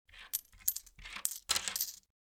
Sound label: counting coins